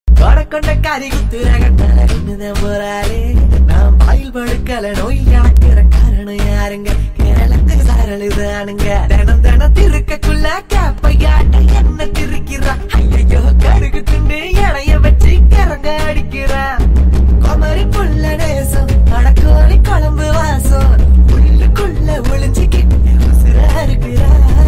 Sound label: 8d bass boosted; oviya